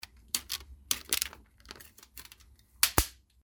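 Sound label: rubber band snaps; Звук купюр, долларов, отдельно и в пачках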